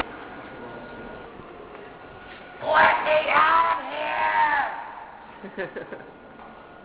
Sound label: scream